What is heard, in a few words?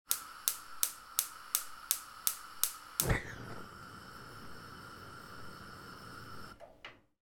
household oven gas stove light ignition; sound effects one